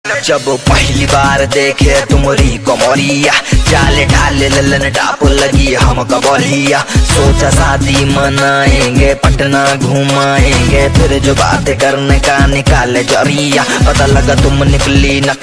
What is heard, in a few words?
ringtone